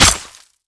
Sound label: hit, shuriken; weapons; garrysmod